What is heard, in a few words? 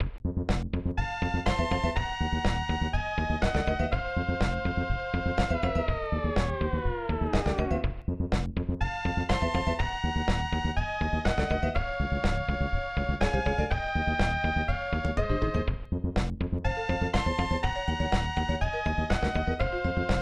Level Music; Spindizzy Worlds; SNES